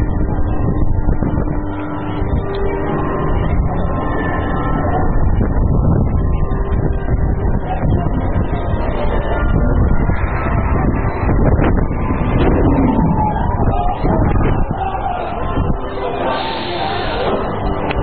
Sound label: Heide Park Soltau free fall scream